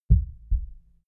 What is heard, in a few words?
heart beat double quiet low